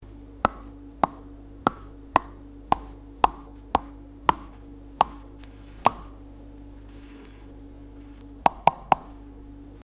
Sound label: a sound effect that a, chopping